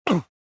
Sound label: land; rebel